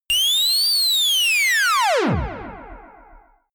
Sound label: high ululation ufo